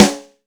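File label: Acoustic Snare; Roland SPD-S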